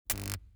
zone electricity zap

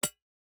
environment pickaxe hit iron

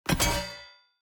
environment battle; Shelter

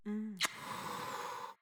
npc strike; Shelter

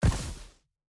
environment block digged up

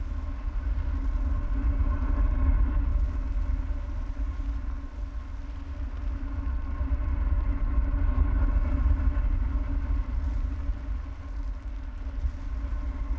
Fire Elemental Move; TANK; 3Souls

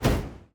necromancer projectile; Shelter

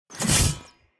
vampire strike; Shelter

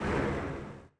ambience room dark temple pentagram ready

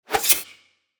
shadow assassin strike; Shelter

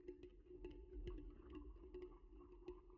stir

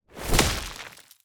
stone golem little strike; Shelter

